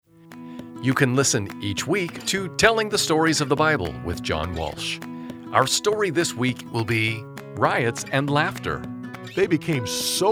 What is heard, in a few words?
Riots and Laughter; radio; 30-Second Audio Promos; Generic Promos